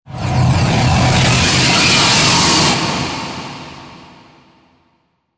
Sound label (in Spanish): tren pasando